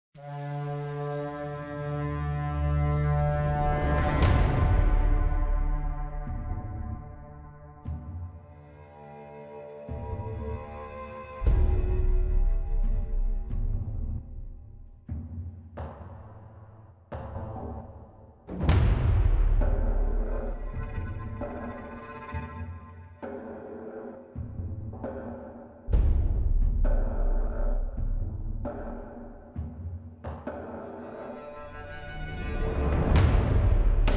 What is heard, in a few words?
music blind pick; lol